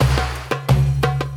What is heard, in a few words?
spingle Drum Loop; netent